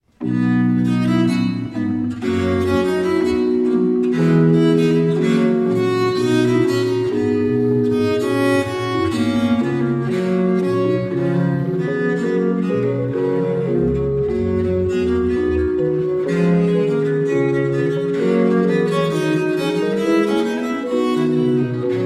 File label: Sonata in C Major, Viola da Gamba; (Allegro); Johann Daniel Hardt; German Viola da Gamba Sonatas